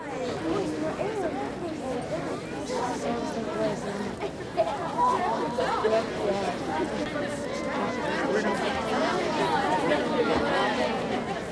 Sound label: Crwd loop